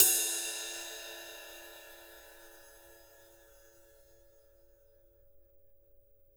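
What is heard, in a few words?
Jazz kit ride, Drums Acoustic; USB Soundscan vol.10; AKAI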